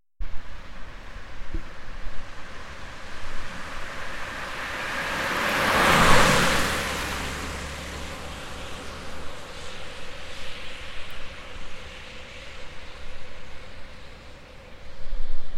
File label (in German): Strassengeräusch 90 Grad